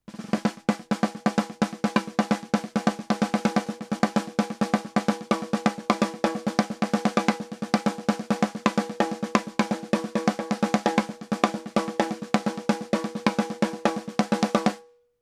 Fredenstein Artistic Comp Plus SD 2 zu 1

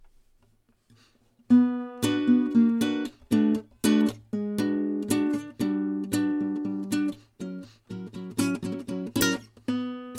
Akustische Gitarre

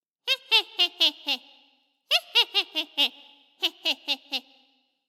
Hexenlachen

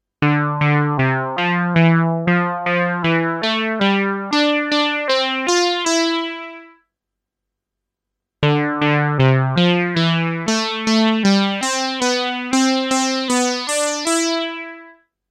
Monopoly Solo Sequenz

